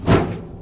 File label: scythe